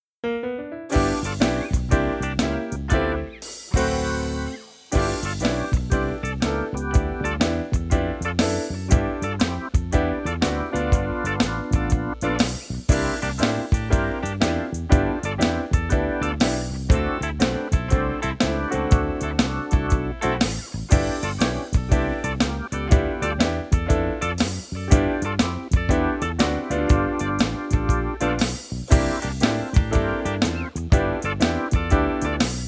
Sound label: Instrumental